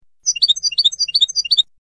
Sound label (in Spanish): floreo tibali tibali, campero